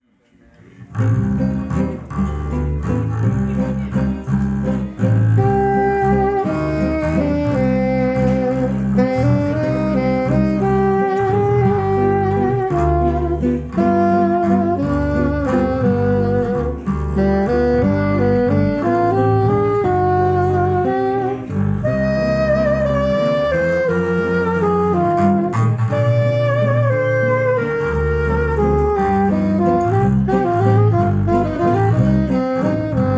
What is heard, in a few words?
WALTZ NO TWO